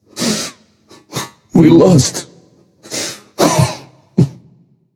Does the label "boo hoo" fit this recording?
Yes